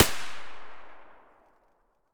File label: ak74u silencer mono distant, weapons; STALKER; IWP